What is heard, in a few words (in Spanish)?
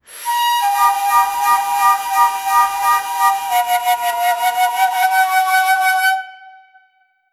el flautista debe crear u, flute